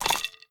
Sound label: skel death; Crypt of the NecroDancer